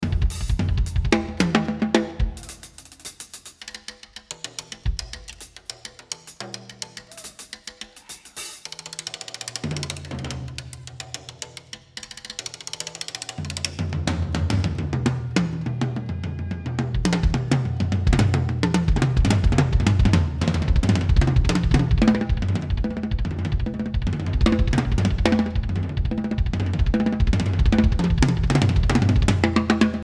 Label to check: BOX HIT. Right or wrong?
Wrong